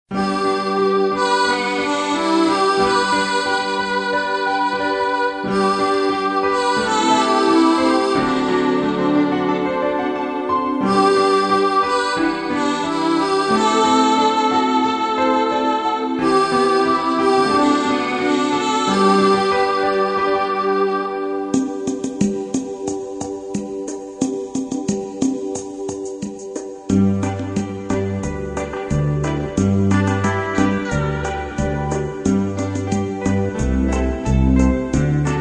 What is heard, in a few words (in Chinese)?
中音、次中音sax伴奏曲